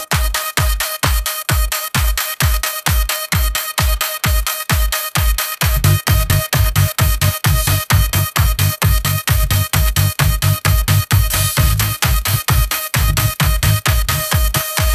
ringtone hiphop

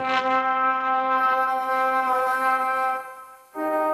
funny

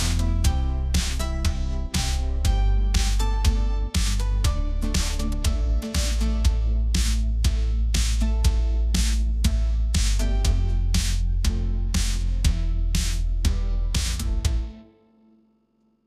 ringtone kpop

